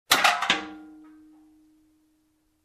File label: Tin Can Rattling